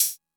hat; MFB-522

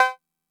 cowbell; MFB-522

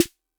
snare; MFB-522

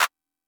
clap; MFB-522

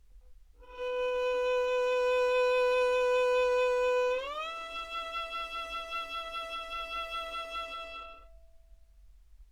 Two Note, violin

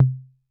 tom; MFB-522

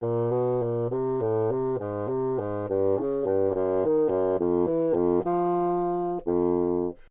bassoon As2 phrase mezzo forte legato; solo instruments

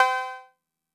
cowbell; MFB-522

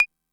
clave; MFB-522

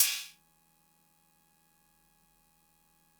cymbal; MFB-522

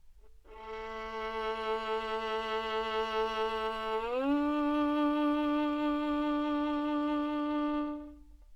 Two Note, violin

